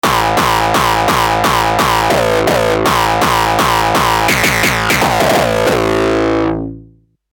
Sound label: synth brainwash kick